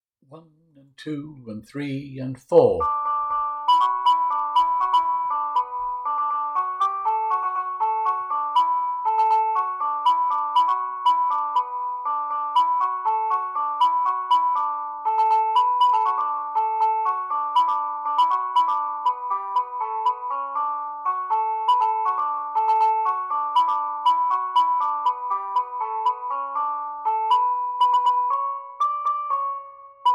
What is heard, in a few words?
Main Melody